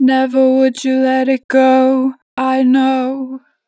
Natural Vibrato, Vocals, Never would you, 2 bar vib; Harmor Vocals